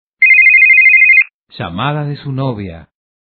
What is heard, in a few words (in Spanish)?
Llamada De Su Novia